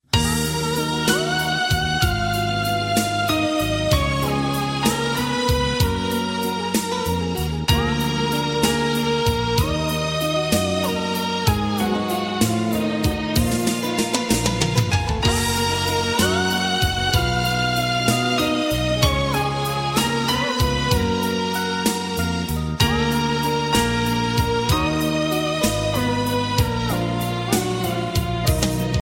armenian music ringtone

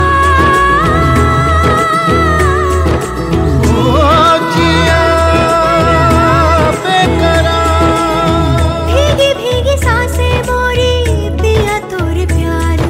hindi ringtone, bollywood

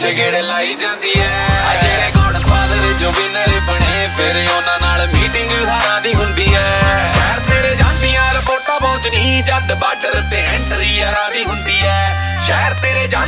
hindi ringtone, bollywood ringtones

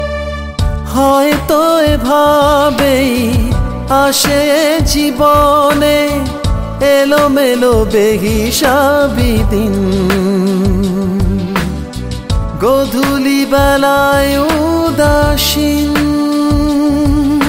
bengali ringtone

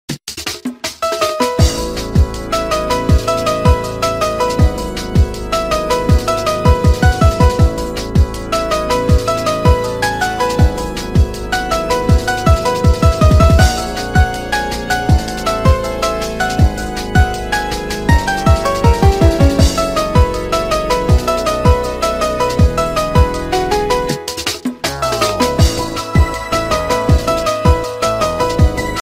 lg woodpecker ringtone

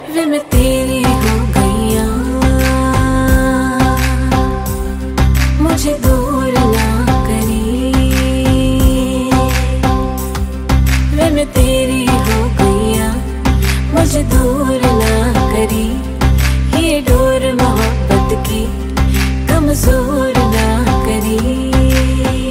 song ringtone bollywood ringtones

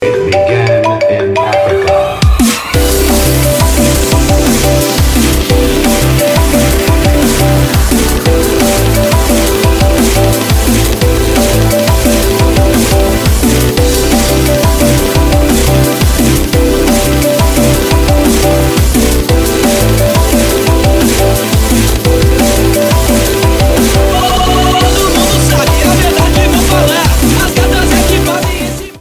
iphone marimba remix ringtone

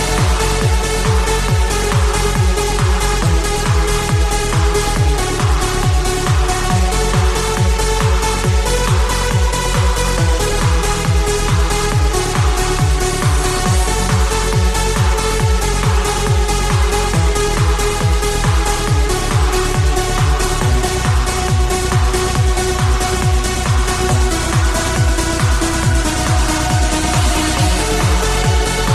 heavy trance ringtone

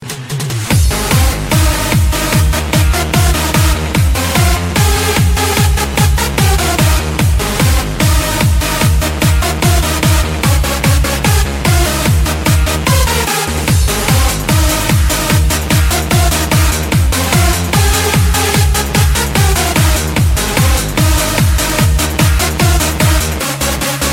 techno ringtone

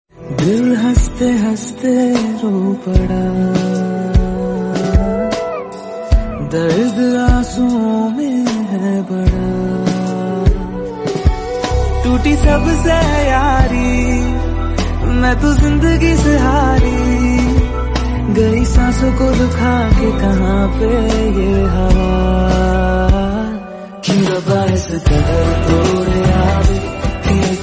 bollywood song tone ringtone

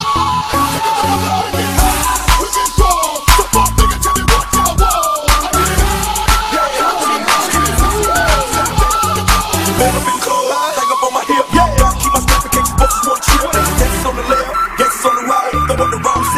party dance english ringtones

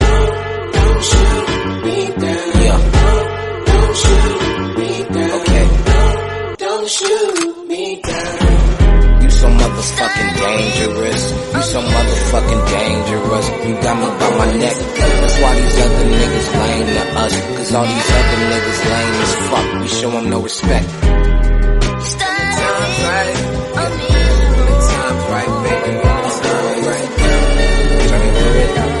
rock music tone ringtone

